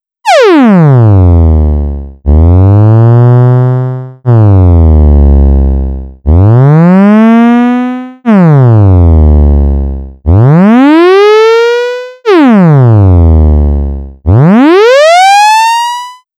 programmable wavetable

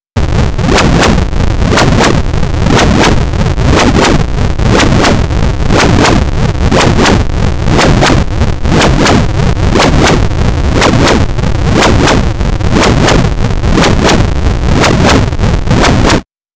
robotic bee; colorful noise

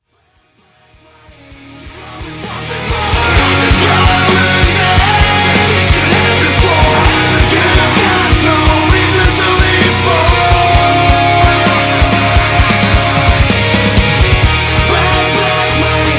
bass alternative

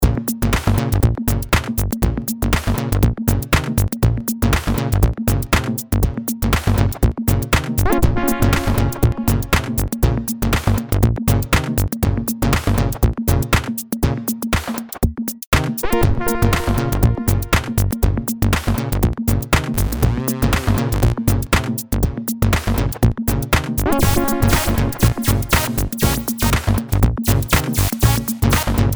mini swing

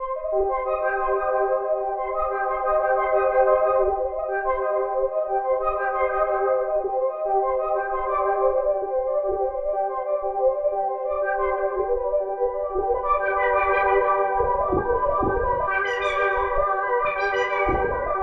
foggy arp

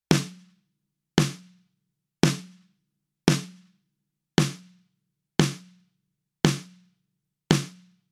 snare short release

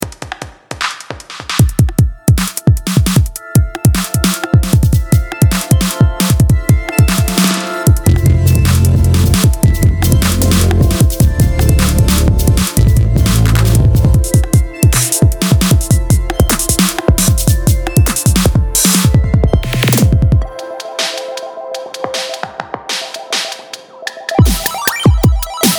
machine attacks

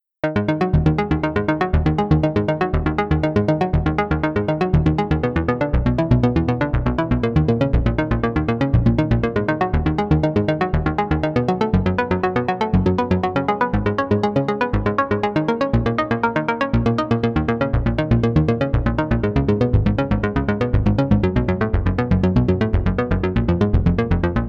disco hit in the 80tys

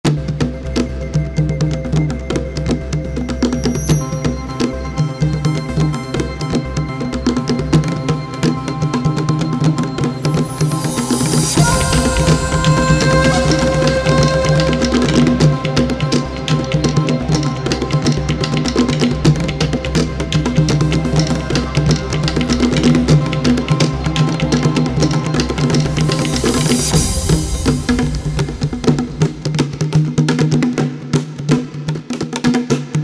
bongo

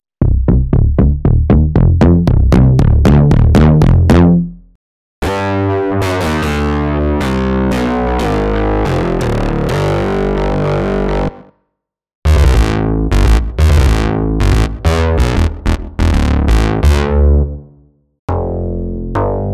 basses nonstep

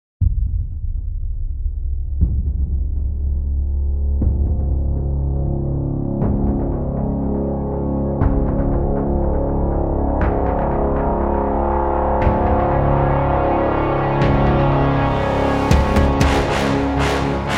symphonic elements braaass

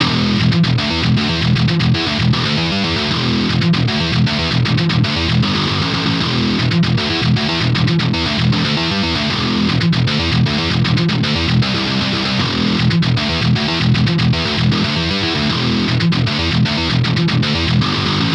hi gain

